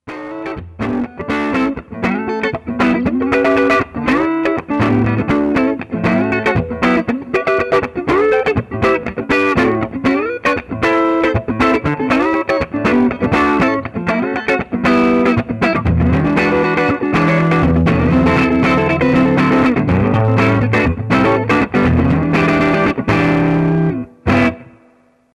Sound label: broadcaster